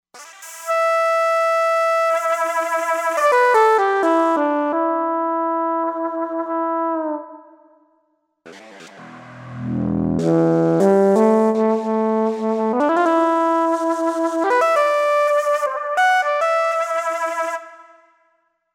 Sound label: Shakuhachi, synth